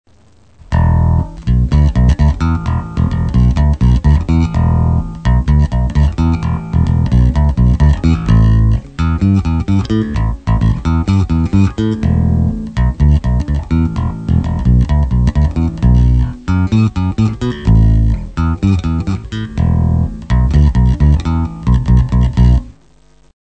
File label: Bassgroove